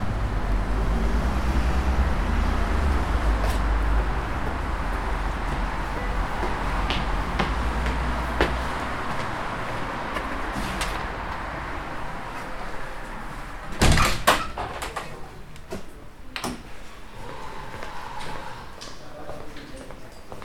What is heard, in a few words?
Opening A Door In The City And Going Inside